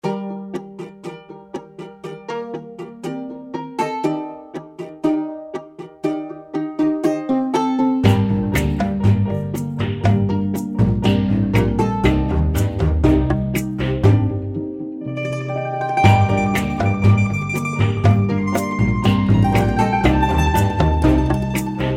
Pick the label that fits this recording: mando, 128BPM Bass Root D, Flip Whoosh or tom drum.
mando